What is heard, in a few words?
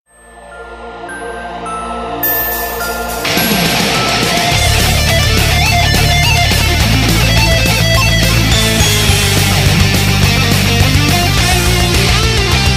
Power Rock